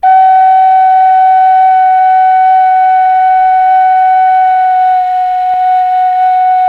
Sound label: KHLUI G#4, KHLUI FLUTE; Spectrasonics; Heart of Asia